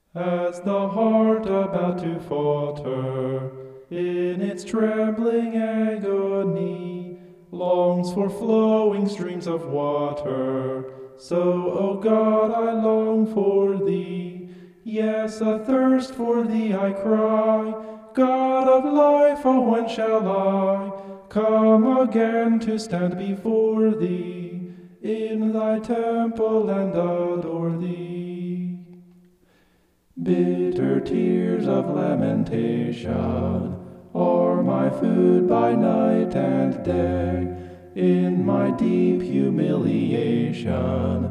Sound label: vocal homoph; psalm 42